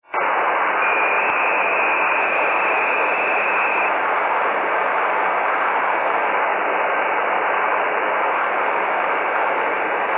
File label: HF Packet Transmissions; A data mode commonly used by amateurs that employs FSK to modulate data in AX.25 frames at 300bps. Is most commonly used for APRS.